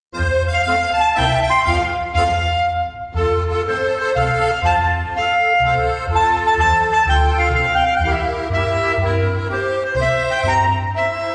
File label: Schneeglockerl-Mazurka, Autriche